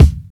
smooth steel kick drum sample d key, kick drum single hit tuned to the D